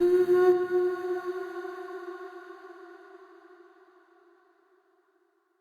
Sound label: Statue Voice F Sharp; Player Instrument; Statues